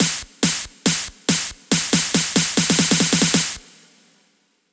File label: 140BPM SNARE 2 Bars; Vengeance Samples; Snares&FX Pairs